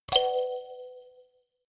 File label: notification kalimba rattle triad minor high